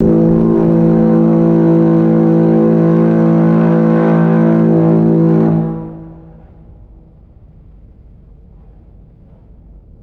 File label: loud buzzy ship horn blast